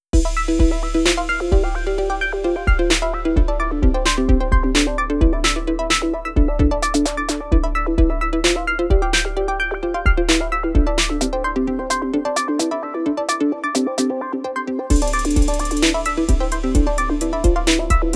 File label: beethoven ode joy hip hop